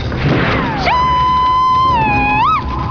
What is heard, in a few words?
battlecry